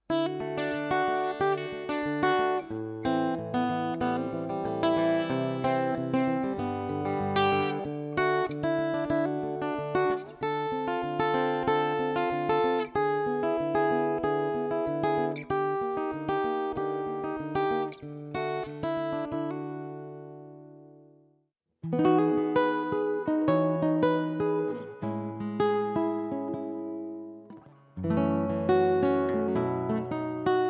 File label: acoustic guitar